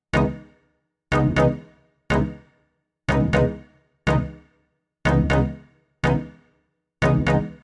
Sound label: Loop